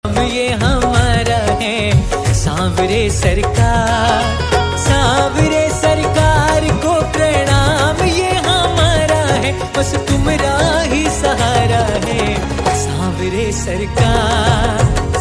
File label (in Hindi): Shyam Bhajan Ringtone